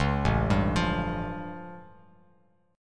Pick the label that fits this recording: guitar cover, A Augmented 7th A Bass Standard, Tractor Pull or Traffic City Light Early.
A Augmented 7th A Bass Standard